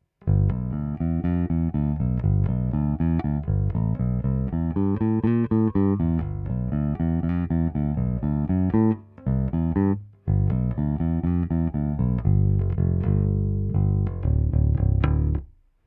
S-x prsty, nerezová ocel, Flatwoundy, Schecter SLS Elite-5 baskytara; D'Addario ECB81 Chromes 45 - 132

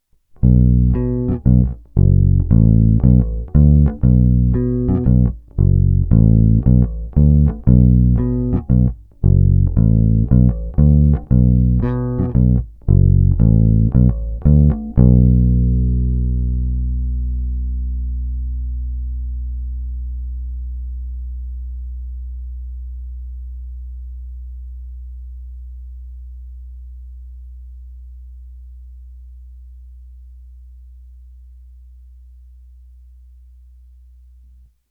Hráno mezi krkem a snímačem RW, Lakland 44-64, Baskytara; Bob Glaub

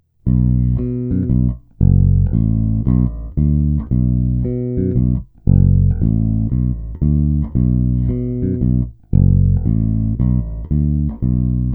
Tónová clona skoro zavřená, baskytara; Harley Benton MV-4MSB Gotoh BM

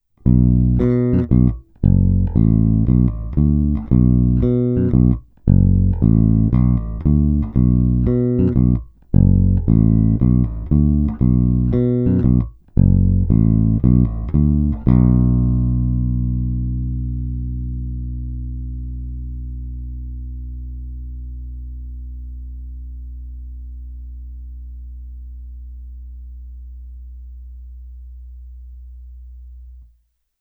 Oba snímače (RW), Mike Lull V4, baskytara

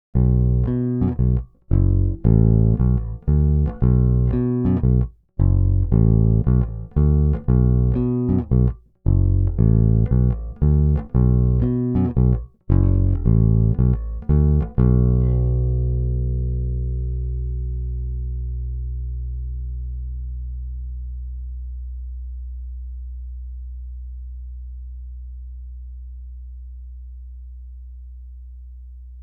Hra mezi krkem a snímačem; Fender Custom Shop Pino Palladino Signature Precision Bass; Nahrávky rovnou do zvukovky

